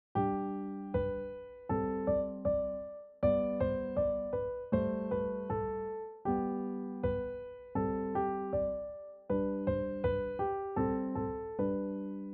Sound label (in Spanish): Dobles notas Sol